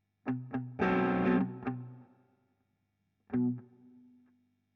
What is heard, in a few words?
GTR Royer